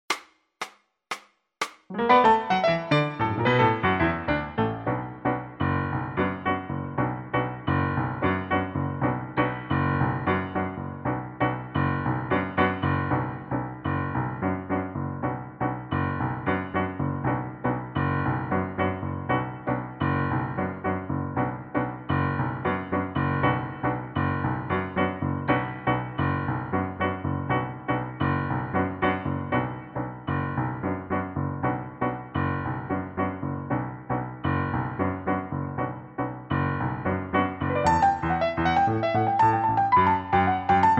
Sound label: piano